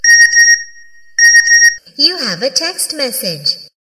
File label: Text message